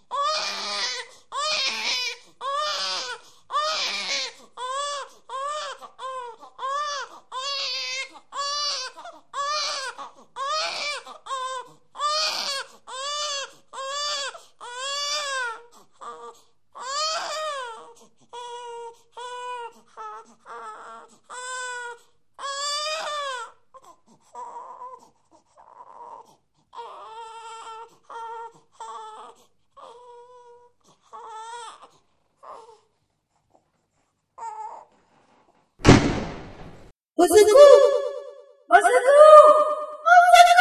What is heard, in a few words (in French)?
conte musical bruitage